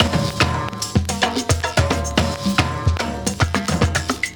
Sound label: growth spurt, Drum loops